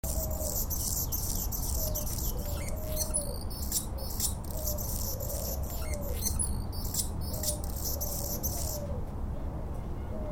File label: Hummingbird Dove in bg